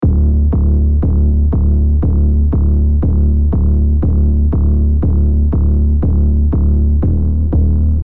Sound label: pulse 120bpm d cinematic trailer sound effects; red hydra